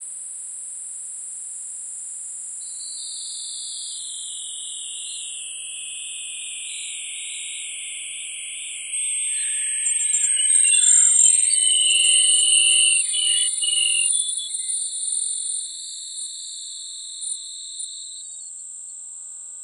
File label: 16Bit